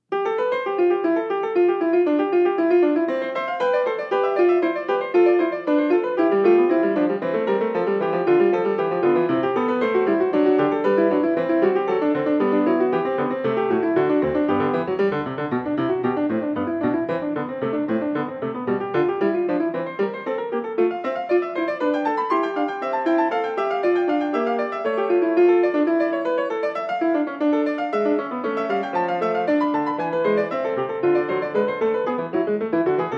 Fugue in C; BWV-952; Bach

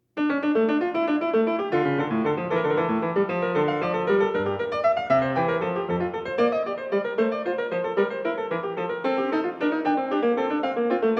Praeludium d Minor, PIANISTS; BWV-935; BACH

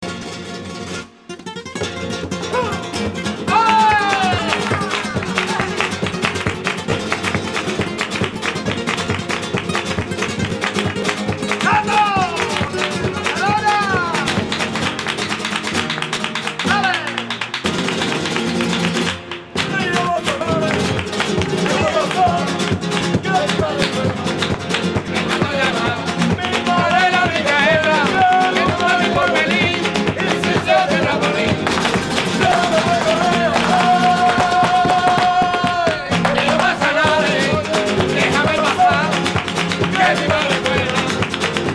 Rumba Gitana, Guitarras Y Flamenco; WORLD MUSIC; SPANISH

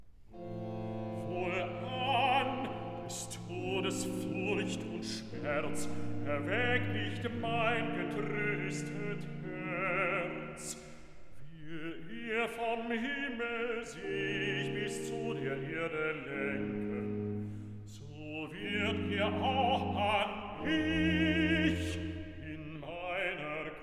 Recitative (bass); cantata; BWV 133; Ich freue mich in dir

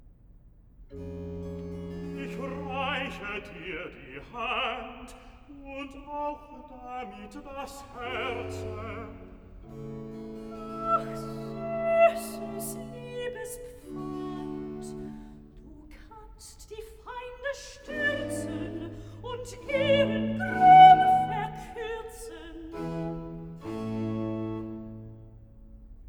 Recitativo Bass Sopran; cantata; BWV 057; Selig ist der Mann; Ich reiche dir die Hand

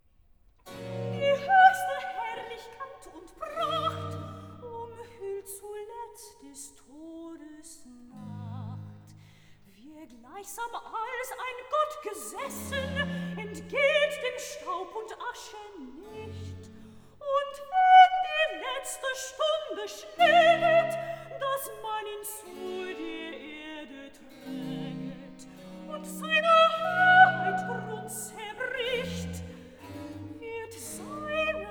recitativo, soprano; vocal; BWV26; Die hochste Herrlichkeit und Pracht; BACH

